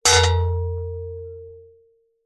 sword strike metal could be shield deep resonation; zapsplat